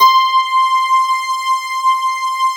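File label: harp shake C5; Synth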